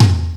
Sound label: TOM, TOMS KIT, Ragga & Dub Reggae